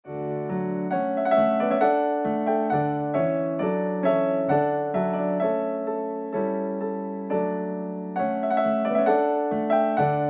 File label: Trumpet Voluntary Fast; Clarke